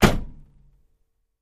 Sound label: Cadillac 1969 Door Slam, Interior